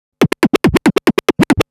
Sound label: Scratch Fills 140BPM; Scratches; Vengeance - Essential Clubsounds Vol.4